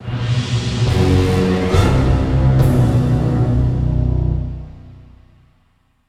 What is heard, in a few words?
war defeat; Castle Storm